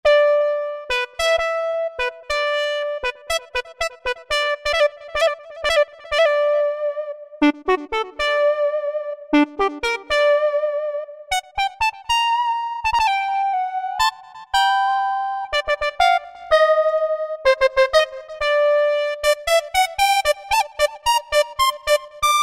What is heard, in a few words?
Roland V-Synth Brassy Lead; Factory Patches